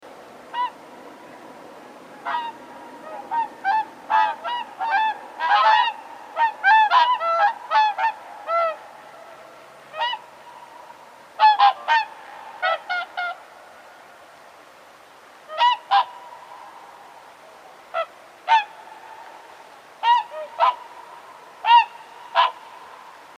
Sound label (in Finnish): laulujoutsen lentoääni